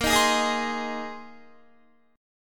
Bb 9th Suspended 4th Bb down Guitar 4F,4A,5C,5G,5C,6E; strummed